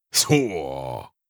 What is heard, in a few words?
effort grunt male